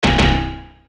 RP システム音

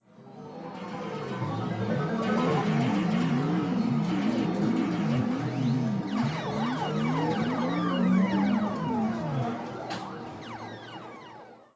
アーケード背景音

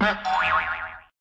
ジャンプ

